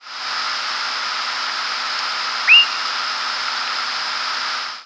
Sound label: Swainson's Thrush; Thrush-like calls; thrushes